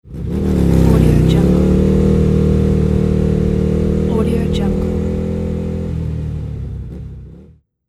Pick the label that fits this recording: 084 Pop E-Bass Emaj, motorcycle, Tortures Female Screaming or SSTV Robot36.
motorcycle